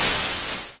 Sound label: terumet heat out; InsaneProtestor; ip terumet